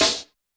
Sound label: Snare Break, YAMAHA RM1X